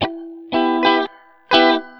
Reggae Em, Guitars; ACID Music

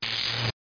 VOLTAGE

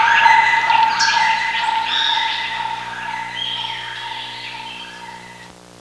jungle; Sound Library 2000; Wayzata Technology; Music In

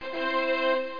TADA

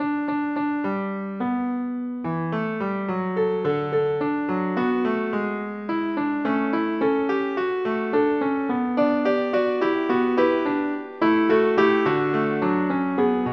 fugue

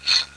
shfflbd, parks; WAV Sound Effects

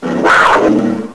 Walking dead attack; Curse of Dragor

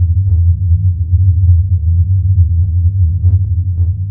impulse loop engine

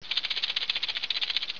rattle, medusa; Hexen II

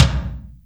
tom, power drum; drums; The Sample Bank

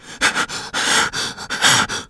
coff moan; shadman

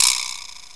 Vibraslap; GMPlay; Drums; XG

